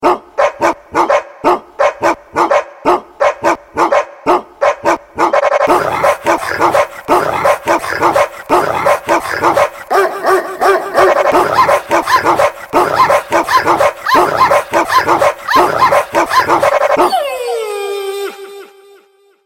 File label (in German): Hunde Rap